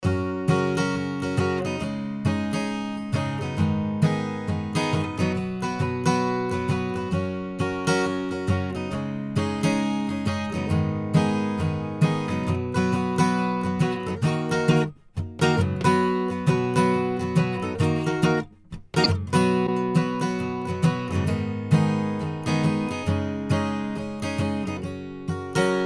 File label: Ac Gtr; Manley mic; Gold; manley mic comparison gold silver refc